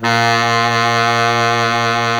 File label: SAX Bass Sax A#2